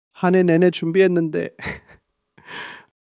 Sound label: crying